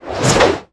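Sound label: attack one hammer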